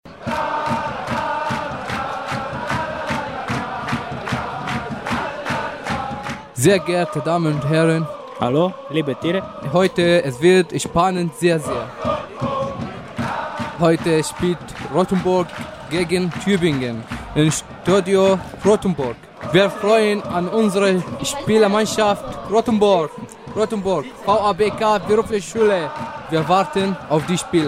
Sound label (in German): fusball jingle